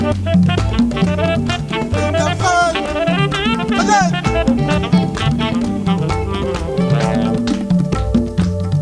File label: RHYTH